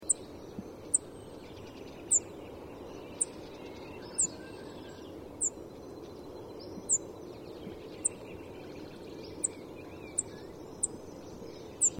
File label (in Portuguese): escrevedeira de garganta preta